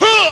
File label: "huh", voice